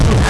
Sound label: bump; Alien Arena; nemesis